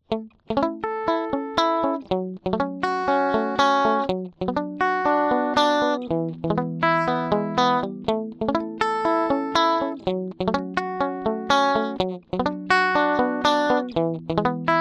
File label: AC30 vintage delay